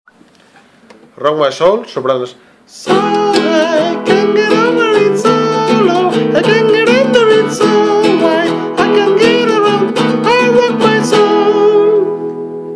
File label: rock my soul soprano